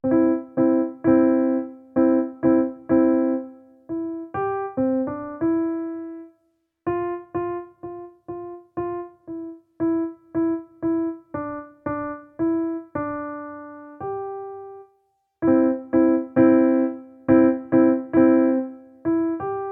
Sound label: Jingle Bells, piano